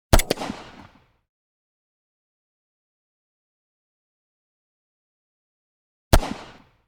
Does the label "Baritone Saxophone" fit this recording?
No